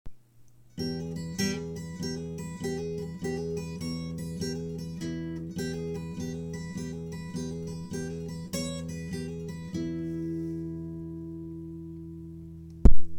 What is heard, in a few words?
high e lick